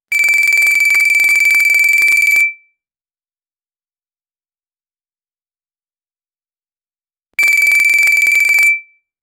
mobile ring sound

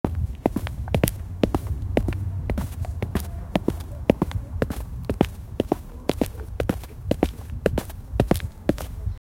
sound of footsteps on gro

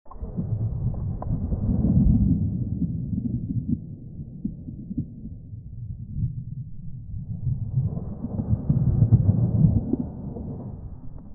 deep sea noise, marine life

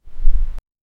very light cartoony chari; beach

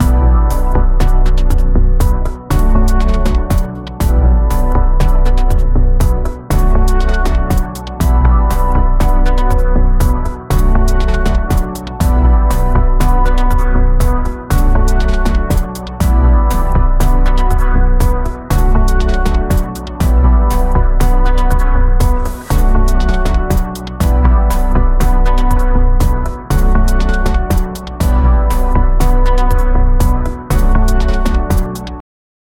emotional calm and energe, advertisement

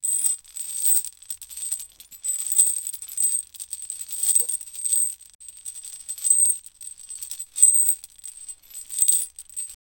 metal chain loops being

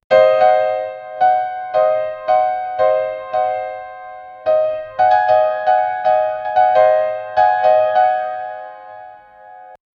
a gothic atmosphere piano

